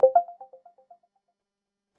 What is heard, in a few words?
Soft Notification Pop